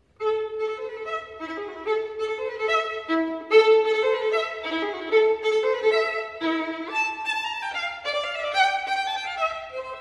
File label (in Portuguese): Violino